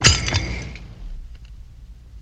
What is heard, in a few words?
Flash Bulb Metallic, flash pops